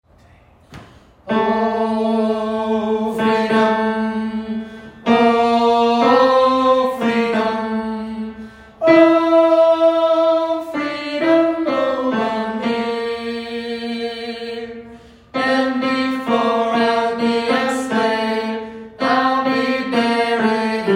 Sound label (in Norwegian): tenor, gospel; Oh, freedom; kongsberg; kor; gospel for alle